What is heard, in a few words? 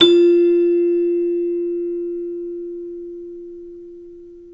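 CELESTA G2, Synclavier Percussion; ILIO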